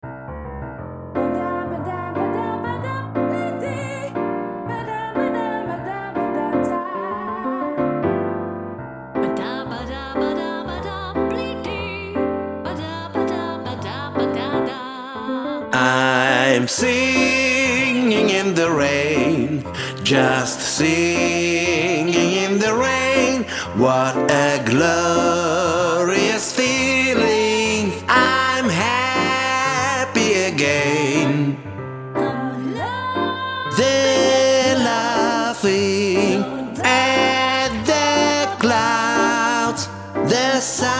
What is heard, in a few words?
bas, muziek